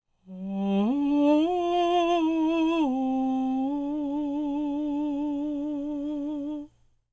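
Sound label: falsetto, Vocal Planet Jazz FX; Spectrasonics; SA FALSETO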